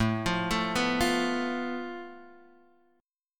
A Suspended 2nd Sharp 5th, Guitar; arpeggiated; JGuitar

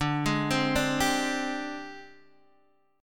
Ab Major 7th Flat 5th D, Guitar

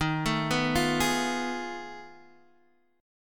F 7th Sharp 9th Flat 5th D sharp

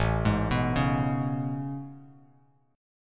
A Major 9th A, Bass Standard; AM9; arpeggiated; JGuitar

